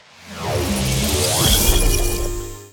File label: Affinity Up